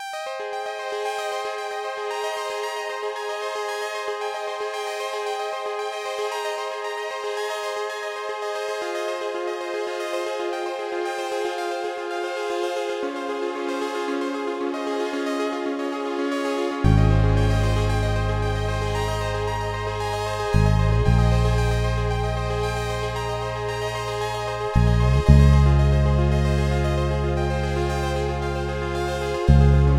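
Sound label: 114bpm C major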